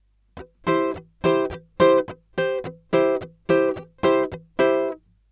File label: Ska Slow